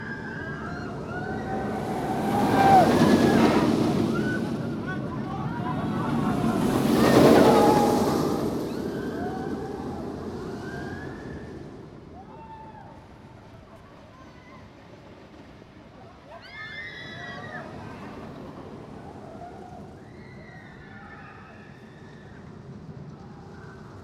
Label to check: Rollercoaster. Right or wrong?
Right